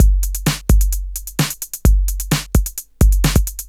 drum 130 electro, drumloops

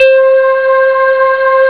voice; Amiga Soundtracker Sample Packs

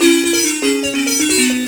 BZ XY GRV, BUZZ XYLO; Spectrasonics; Heart of Africa 2

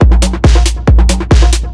drm 138, Techno, drum-loops; Techno ID

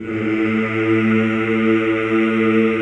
CHO A1, Aaahh Yhh; Sound & Vision - Gigapack I CD 2 (Roland)

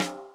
snare, Millenium MPS-400

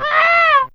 Laugh; Humans1

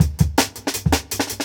165, Jungle Breaks

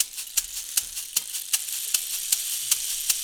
Roots Reggae; Sounds Good - Roots Reggae

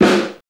Fairlight CMI III Snare, Drum Machines

